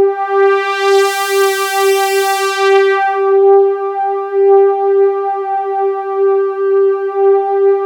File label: full analog; Sound Gallery - Charged